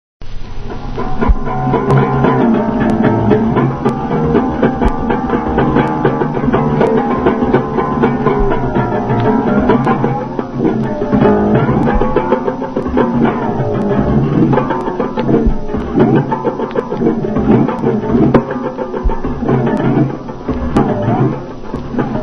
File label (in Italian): arco enarmonico; esempio sonoro; russolo